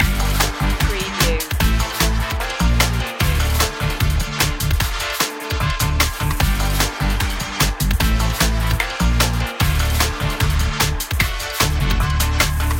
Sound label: fast edgy attitude loop